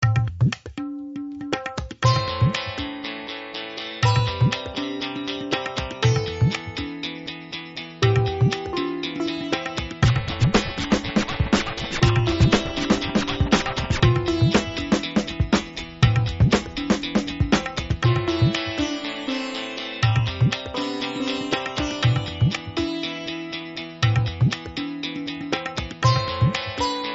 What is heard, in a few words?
tabla guita